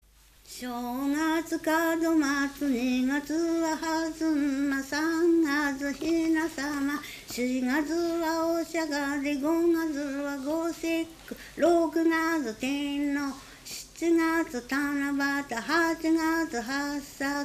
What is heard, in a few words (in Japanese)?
temariuta, minyou